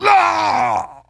Wound Heavy; Putress

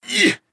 Wound; Valanar

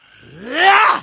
troll attack; Halazzi

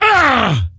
Wound Crit; Ley Guardian Eregos

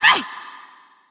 Wound; Julianne

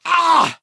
Wound Crit; Valanar

